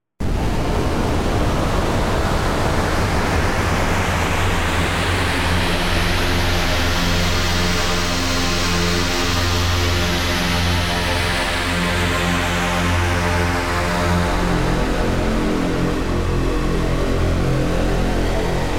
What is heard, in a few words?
Tone2 Icarus